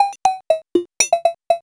POLYMOD; AMG - Vince Clarke Lucky Bastard

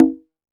H CONGA OP, CONGA

